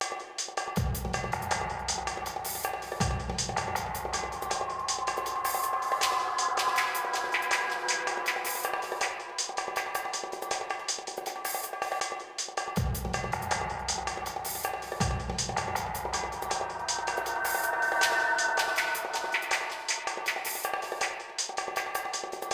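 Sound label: Running Loop, music; bolt 2008 pc gamerip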